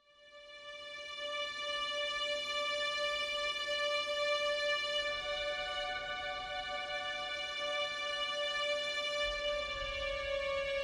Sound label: Strings and Vocals Only Mix